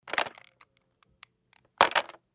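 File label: Telephone Pick Up Hang Up Light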